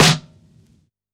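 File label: Vinyl Snare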